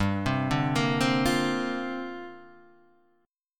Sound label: C 7th Suspended 2nd Suspended 4th, G